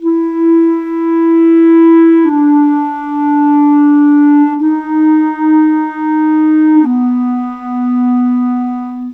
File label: Clarinets, Cinematic; Sonic Foundry (Sony Creative Software); Orchestral Series VOL-3 - Cinematic